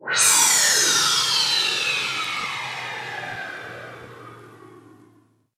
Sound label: FX Sweep Down; vengeance essential club sounds-3